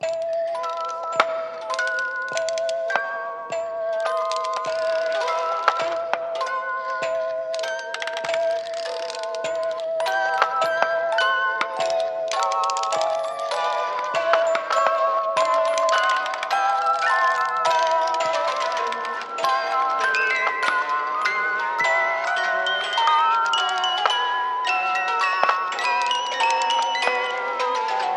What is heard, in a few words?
Ambience Music Box; Arctic Ballora; Five Nights at Freddys; FNAF Special Delivery; android ios